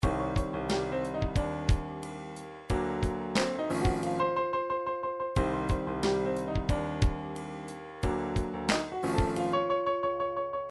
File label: triphop; Newtronic Triphop Construction Kit